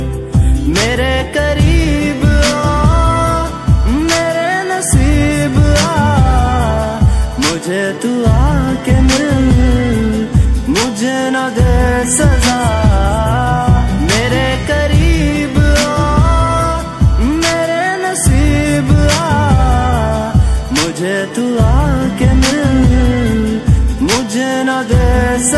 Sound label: Bollywood Movie Song Ringtone; Hate Story 4